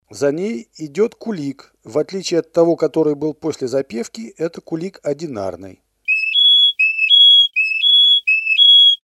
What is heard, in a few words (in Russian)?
kulik